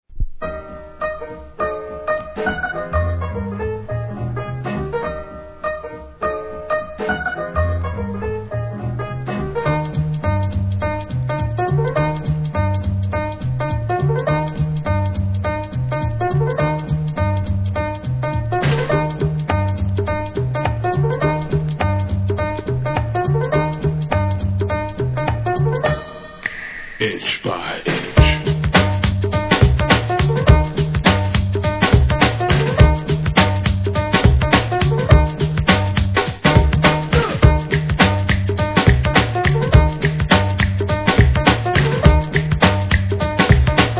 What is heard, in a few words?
beats